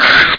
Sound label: bite, spider; Hexen 2